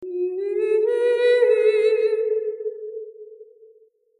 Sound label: Hall 4 Classical 105-135